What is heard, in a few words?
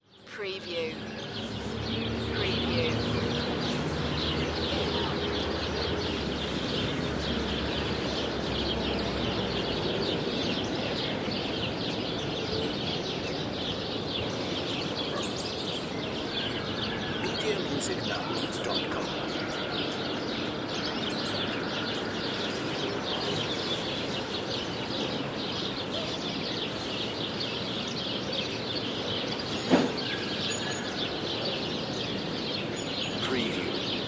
Farmland Ambience; Nature Sounds / Ambiences